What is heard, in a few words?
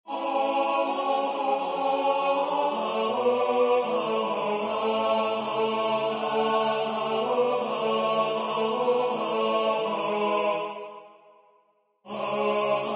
tenor; Midsommertid